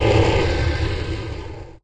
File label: dragon opening